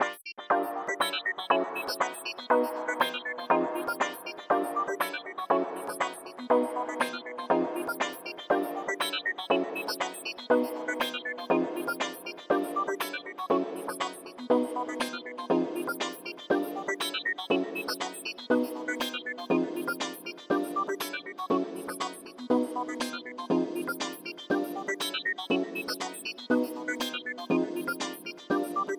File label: Disco Stars Ringtone